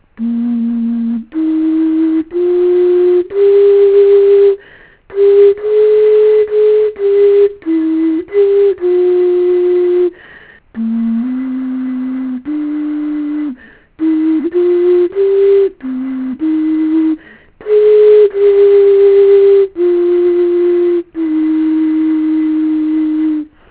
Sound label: xun